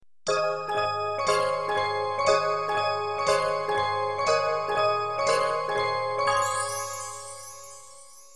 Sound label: Kawagoe Station Song